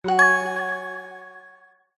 multimedia game sound kids warm mellow positive ping